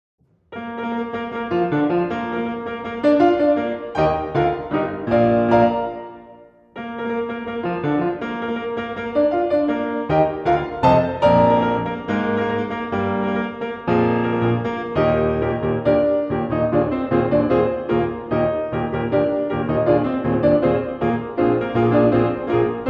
Solo Piano; Iolanthe Selections

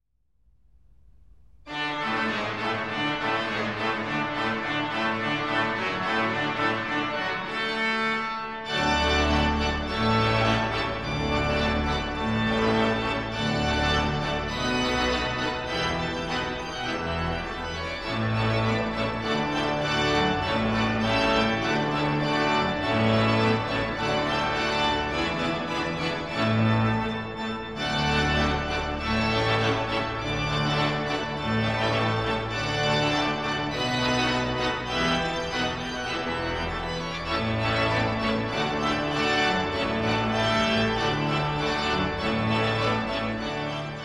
Marches Militaires, D Major. Allegro Vivace

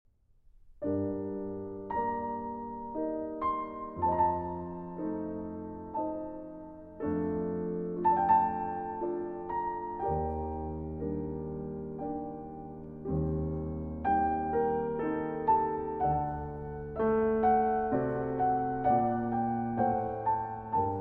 Suite in B-Flat Major, Menuet; HWV 434 IV.

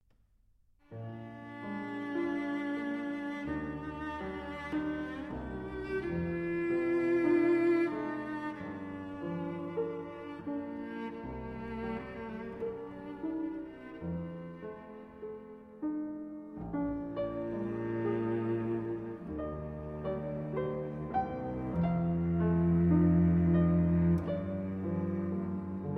Cello Sonata in G Minor Largo

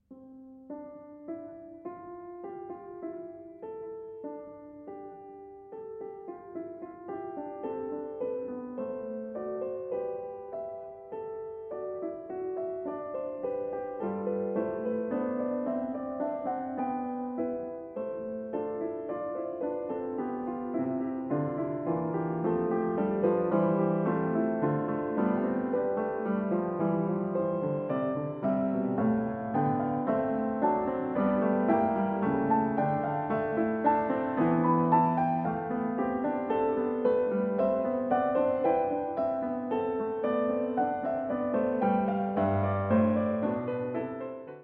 Prelude & Fugue in C Major, BWV 846 II. Fugue

